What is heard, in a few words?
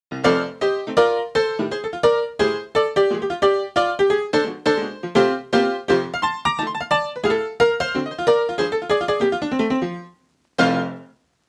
upright piano honky; xln addictive keys